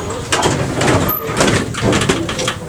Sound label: open door, vehicle; liaz677